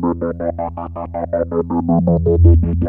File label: 3100 E 3, Korg PS3100; Back In Time Records; Korg Universe VOL-1